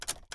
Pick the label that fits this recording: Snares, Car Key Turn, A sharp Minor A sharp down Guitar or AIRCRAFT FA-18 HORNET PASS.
Car Key Turn